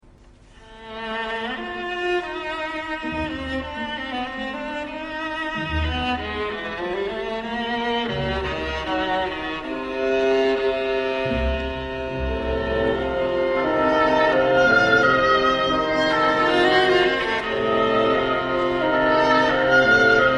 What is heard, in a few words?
viola